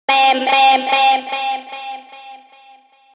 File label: tuning